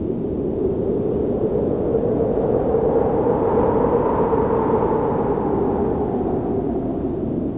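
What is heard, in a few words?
ambience wind loop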